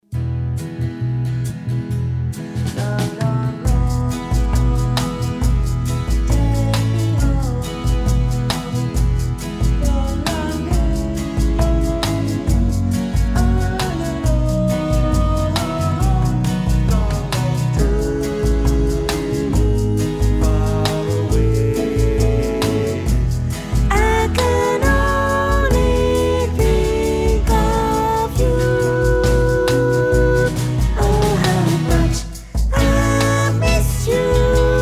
Sopran

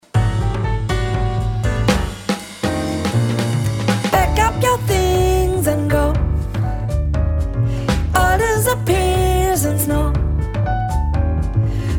Sopran

